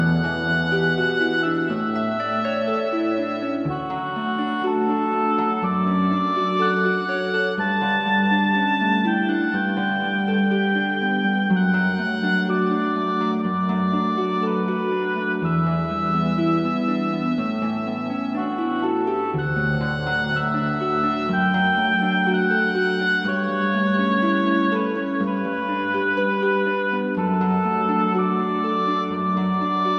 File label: Morning Vigil Instrumental